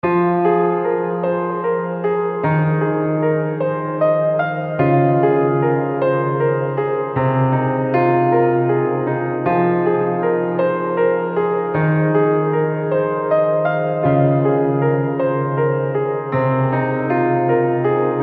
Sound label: Mournful Piano Deep Cavern